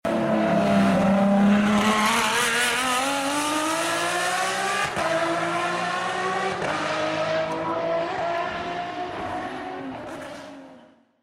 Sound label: FERRARI FXX GT RINGTONE